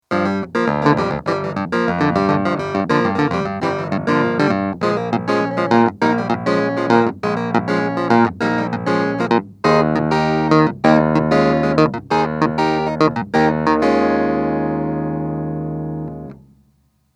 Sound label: Hohner Clavinet D6 Pickup CA